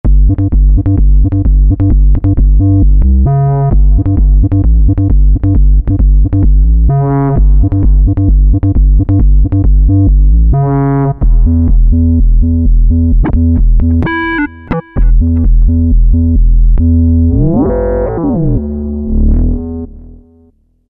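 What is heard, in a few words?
Dance Bass, Roland V-Synth; Factory Patches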